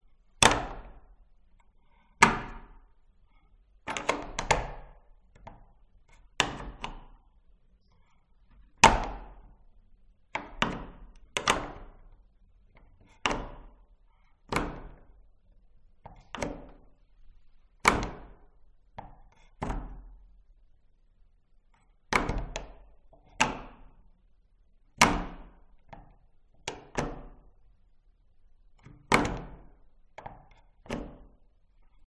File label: Door Abandoned Mansion Room Perspective Reverberating Old Wooden Door Lock; Bolt Vintage; Skyes Audio